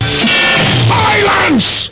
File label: silence